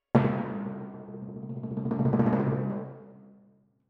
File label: Twin F Timp